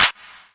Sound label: Korg M1 Clap